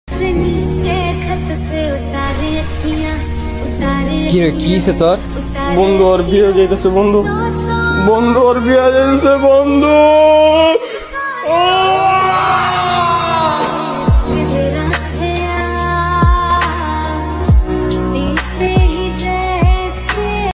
slowed reverb; free fire

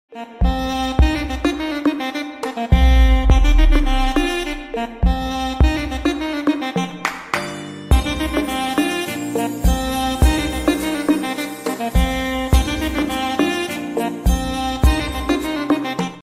instrumental bgm ringtone; gangubai

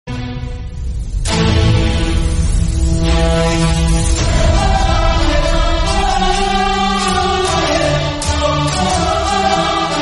telugu ringtone south ringtones

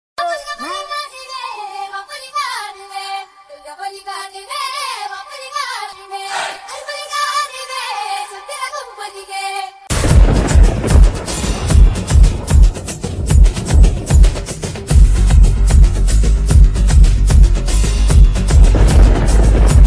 CLIMAX CHASE BGM; arm; tovinothomas; kritishetty; basiljoseph

